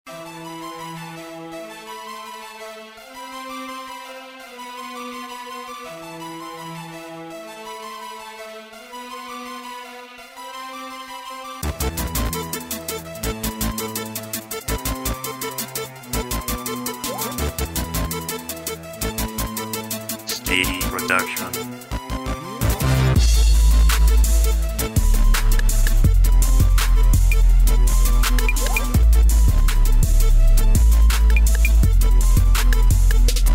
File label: Gangsta beat